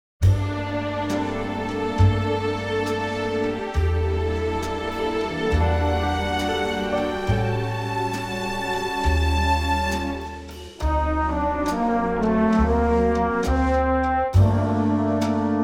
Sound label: orchestral Gm; key - Gm (end section is in G) - vocal range - Bb to Eb; Stunning orchestral arrangement of this exceptionally good song from the movie "Th